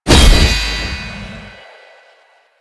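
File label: Incarnon Okina Dagger Projectile Die; Duviri; Incarnon Adapters; Incarnon Okina Prime; Weapons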